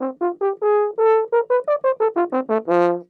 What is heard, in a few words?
horn